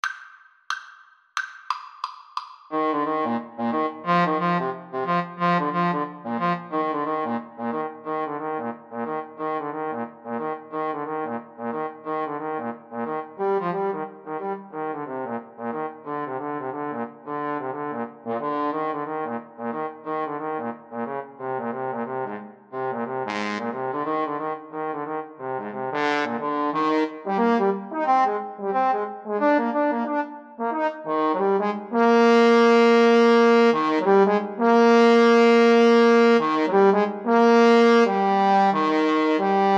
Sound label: Trombone Duet